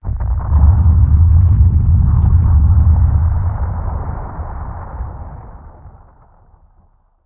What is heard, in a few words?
avalanche; starsiege